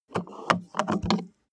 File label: car bmw e46 exterior gas cap spin open